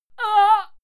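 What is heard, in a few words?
female fight pain, screams; schreie; menschen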